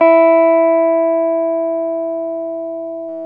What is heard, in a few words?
GUI SUS E 3, E-Guitar; E-GUITARS